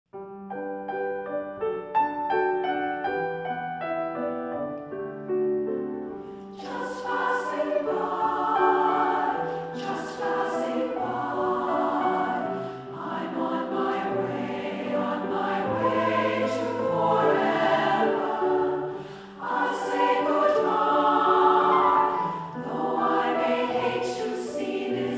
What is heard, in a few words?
Just Passing By, Singers; Aeolian Singers